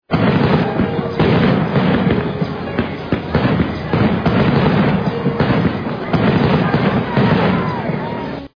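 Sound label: square fireworks sound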